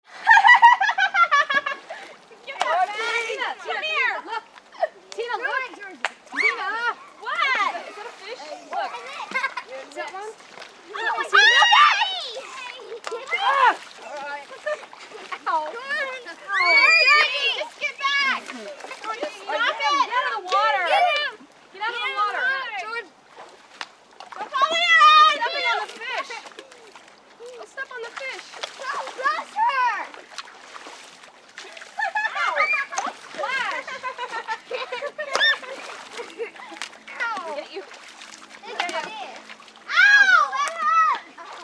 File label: Children Playing in Pond - American kids; Human